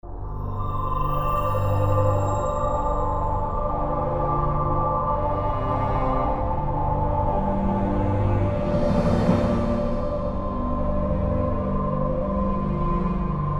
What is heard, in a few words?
race; Galactic Civilizations II